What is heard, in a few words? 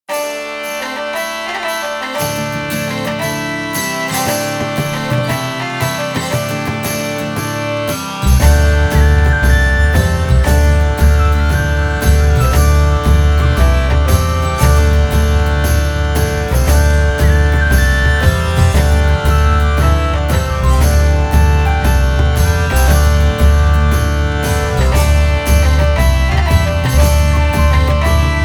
Music